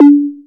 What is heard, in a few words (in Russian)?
knopka zheskaya zvonkii blizkii multyashnii